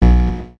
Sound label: zap